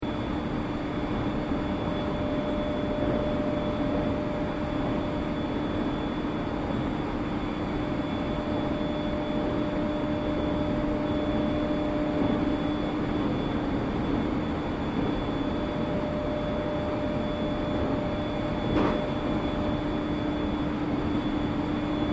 Train IGR Regional Sannohe Local Interior Operating Loop, Japanese Trains; Vehicles